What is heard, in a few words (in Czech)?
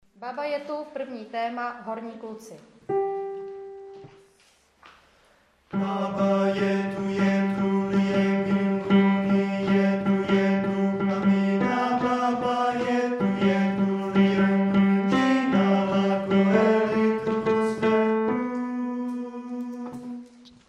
prvni cast kluci tenor